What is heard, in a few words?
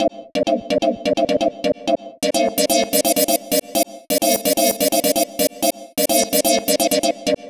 Lead 128, Club Techno Lead Loops